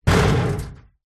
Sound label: impacts metal hollow cabinet old knock hard rattle